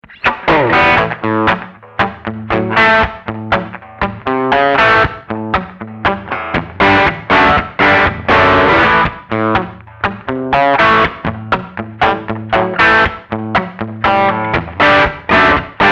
Light Crunch

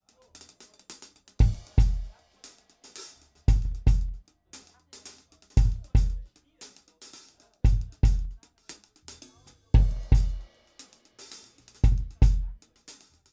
Roland SC Delta + Octa OH + BD

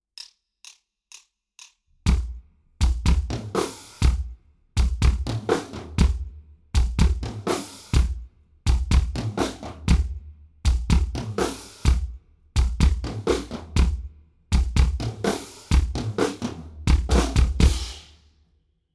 Yamaha EAD10 FX

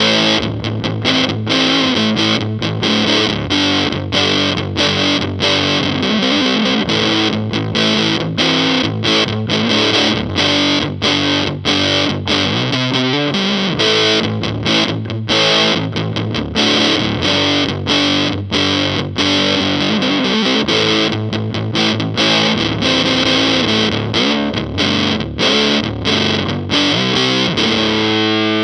Authentic Fuzz Face; Dunlop

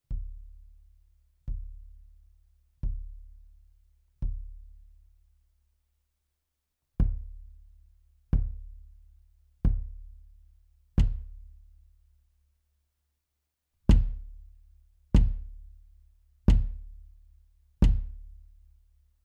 FUNK

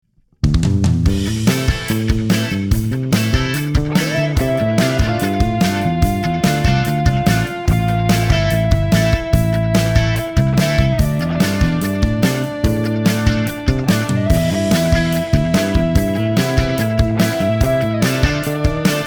Neve 88RS Bass drum git all together

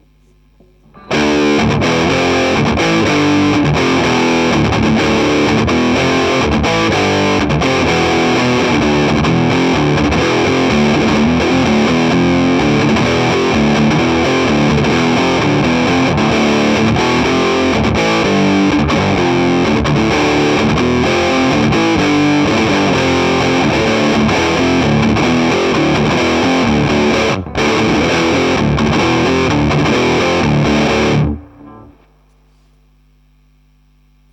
High Gain

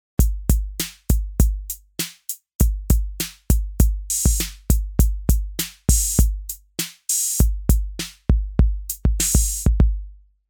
808 Analog; Revolution

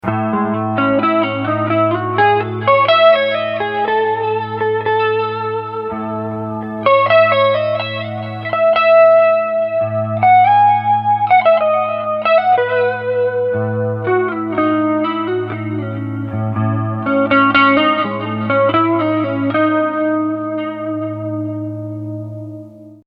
Lydian Lick clean Pick

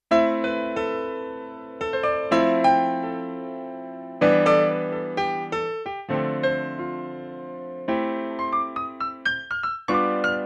Piano Studio

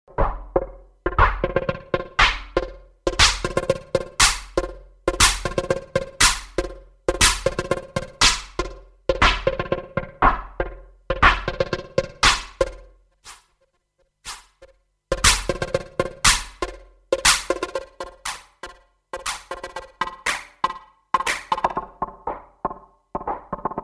volca sample chord hit thing synth

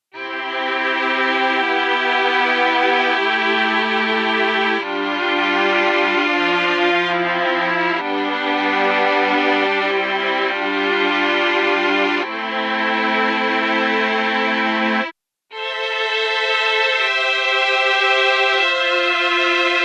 Mellotron M4000D 3 Violins; MusicMaster

